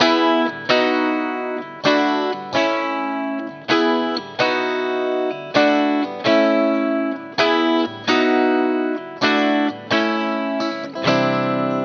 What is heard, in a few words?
Pop Guitar Dry